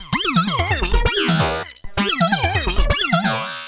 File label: Android Arps 130BPM